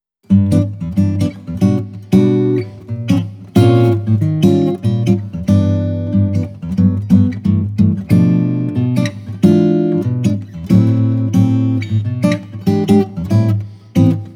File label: Swing